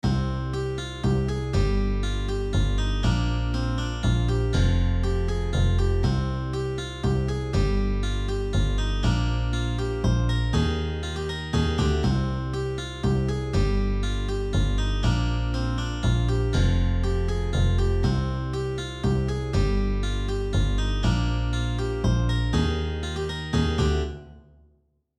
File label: Folk